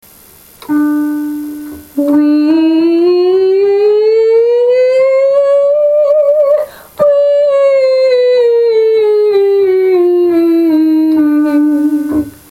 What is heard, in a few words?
Chromatics on 'ee from 'D', Singing Exercises